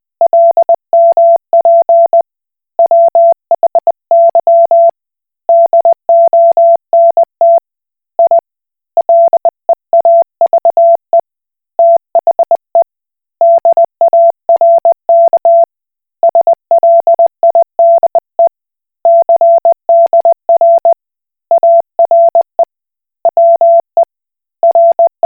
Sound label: Koch Morse